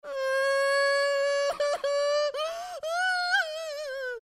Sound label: cry